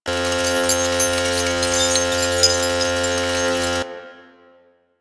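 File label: Research Facil Crane Move Horizontal Loop; Venus; Objective Rooms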